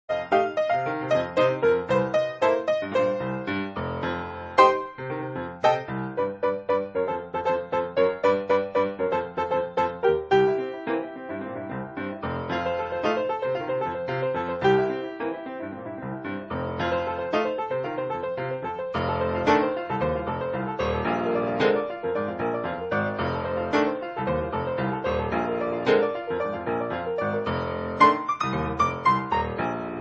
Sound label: grand piano; Paperboy neighborhood theme